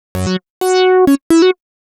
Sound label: Funky Lead; Sonic Foundry (Sony Creative Software); Afterhours EDM